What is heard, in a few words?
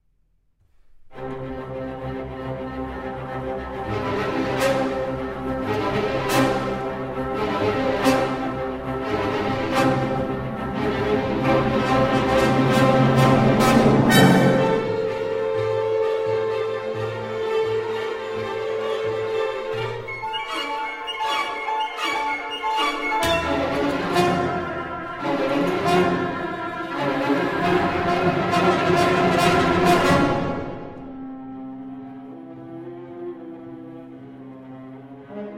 Violin Concerto; Symphone Persane